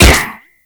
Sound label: UMP Shot, Weapons; Prisma